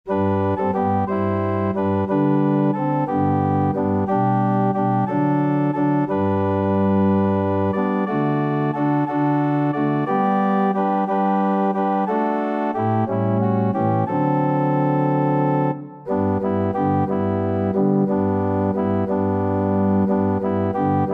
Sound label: jesus is the world, organ